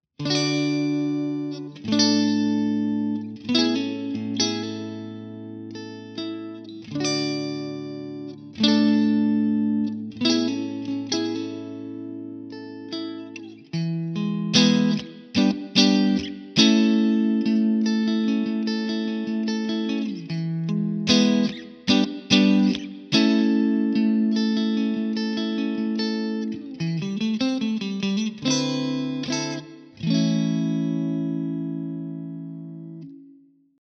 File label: clean; jensentone